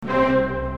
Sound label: accord